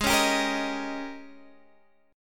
Ab Major 7th Suspended 2nd Suspended 4th Ab Guitar DADGAD